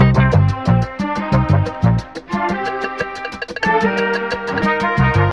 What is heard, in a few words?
strings steady risin; Loops; Phonky